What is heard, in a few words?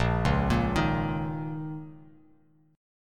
A sharp Minor Double Flat 5th, Bass Standard; arpeggiated; 6,4,8,8; JGuitar